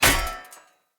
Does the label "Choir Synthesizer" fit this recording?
No